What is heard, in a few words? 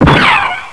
EMP cannon